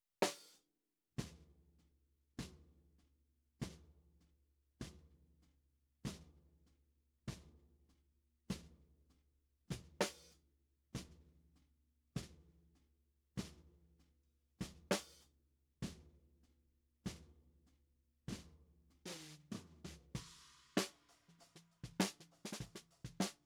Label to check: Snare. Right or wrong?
Right